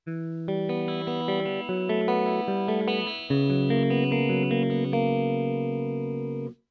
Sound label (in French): Guitare electrique arpege